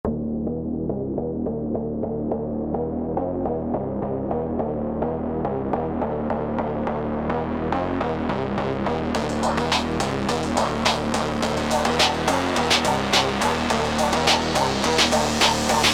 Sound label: Rise Funk slowed Reverb